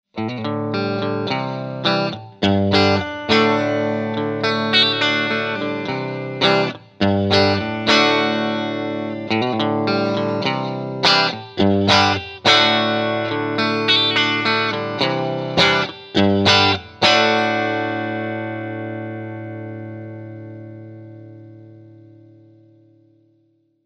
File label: Dynaplay bridge